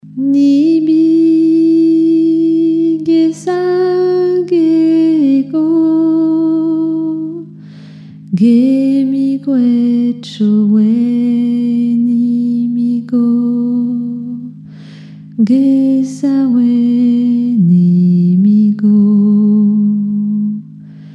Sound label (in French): chant eau; nibi